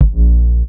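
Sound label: Bass Bb with drum kick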